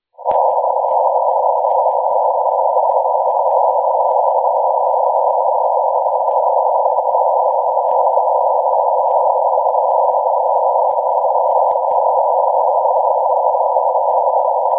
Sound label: MT63 500 test message